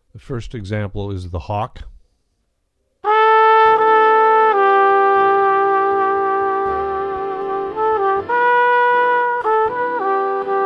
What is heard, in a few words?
flugel test Alternating